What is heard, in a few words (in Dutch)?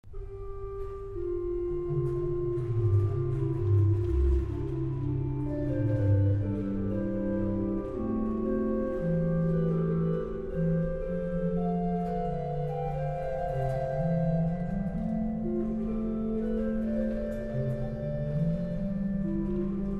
orgel